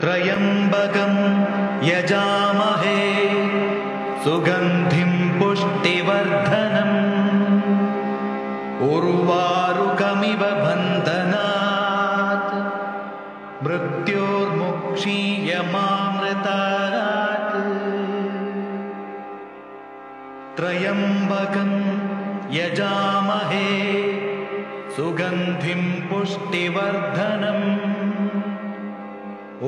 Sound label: Maha Mrutyunjay Mantra Chanting